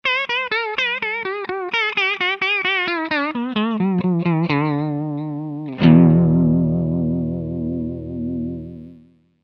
space chorus vibrato strat pod; line6 tonecore

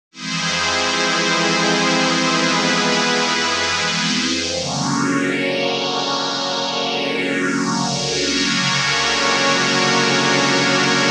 crumar multiman soft phase; gforce vsm

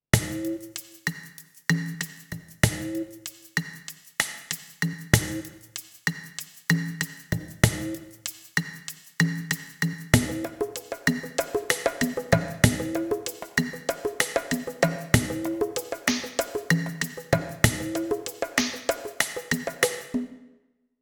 drums percussions; kurzweil pc361

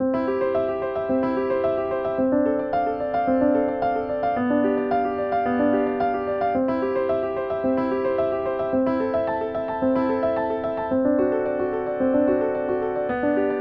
piano grand; motu; machfive2

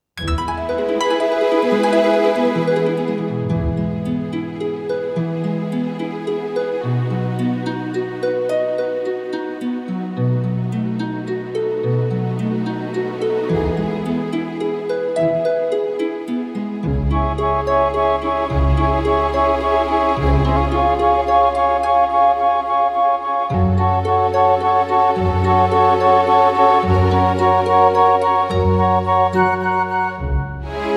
style romantic ballet; movie and show; yamaha tyros3

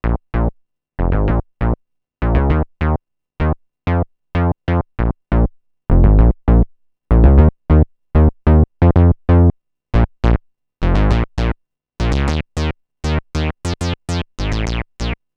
bass moog mini; Minimoog; arturia origin; basses; du gros, du gras et du lourd

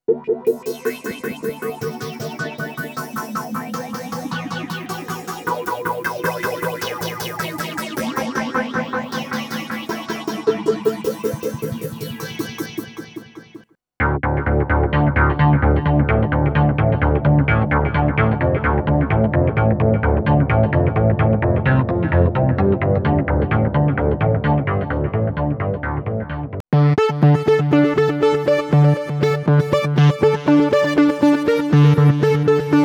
arpeg, waldorf blofeld

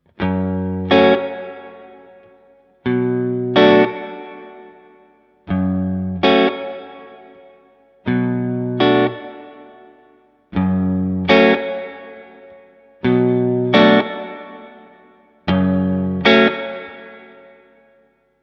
reverb hall; bogner alchemist

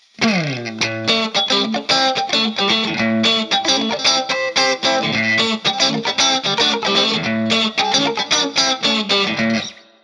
funk; behringer iaxe

